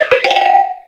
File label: pokemon cry